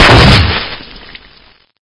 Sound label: toad blast; sven